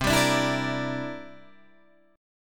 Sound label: C 9th C down Guitar Open D